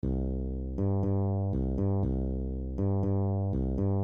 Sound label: Bossa Nova Groove Variations